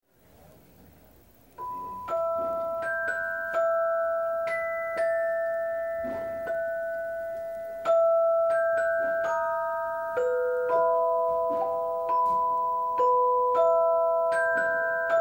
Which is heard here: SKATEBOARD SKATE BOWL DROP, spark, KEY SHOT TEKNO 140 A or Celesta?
Celesta